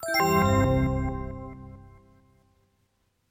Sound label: mush Sound; EggHeads